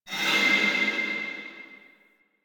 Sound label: Vaults Keyglyph Fade In; Warframe; Entrati